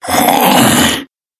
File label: monster lizard